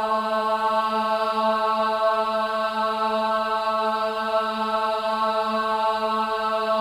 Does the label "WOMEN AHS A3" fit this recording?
Yes